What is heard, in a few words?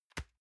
envelope drop soft